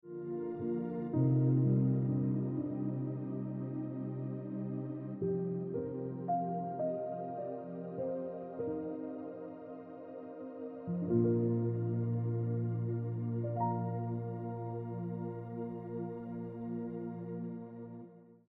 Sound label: Ringtone Piano Music